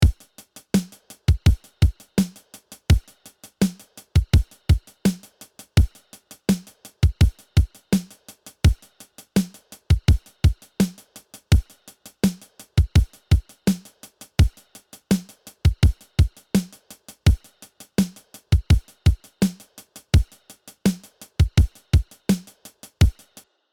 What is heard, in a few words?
Roland TR 626 EQ Saturation Transient Comp